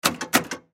Pinball Flipper, Pinball Flipper Press Button Double; Arcades; Sports; Blastwave